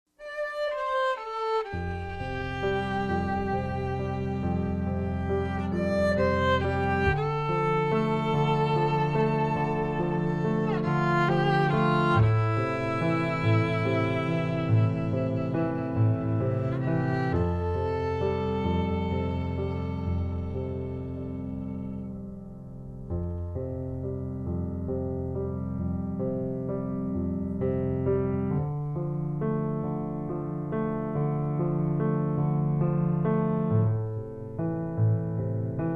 peace like a river med acc VIOLIN

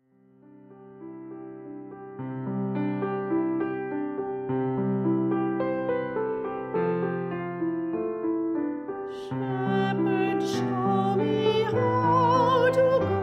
Feed My Sheep high acc

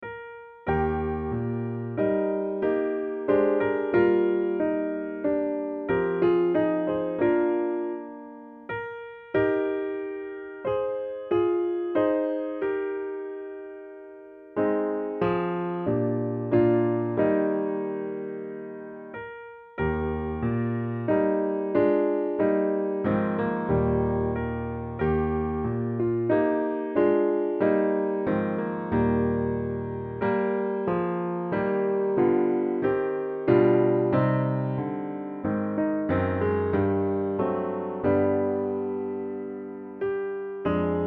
POWER Piano Acc Eb MED

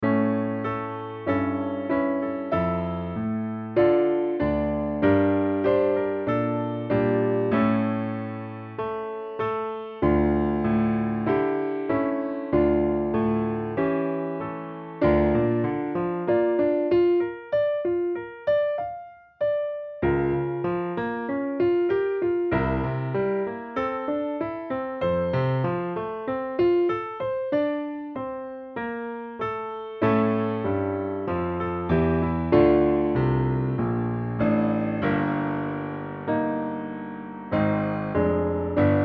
Piano Acc F HIGH

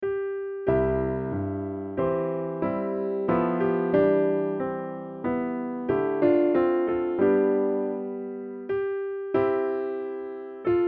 POWER Piano Acc C LOW

